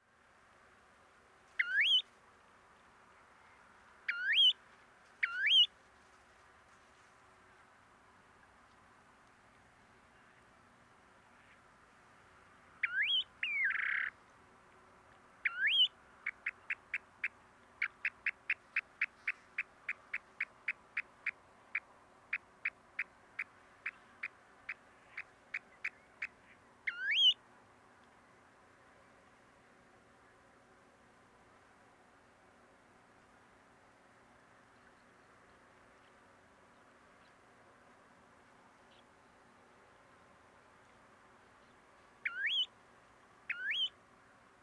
Snowy plover

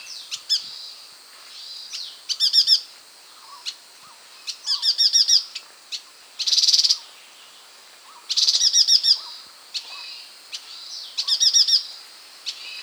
Striated yuhina, پرنده یوهین راه راه

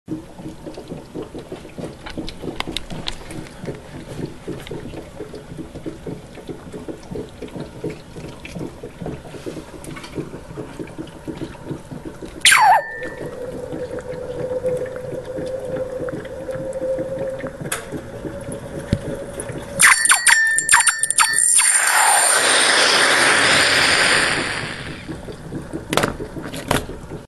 Hot iron in water; آهن داغ در آب

